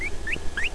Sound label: Guinea Pig In Distress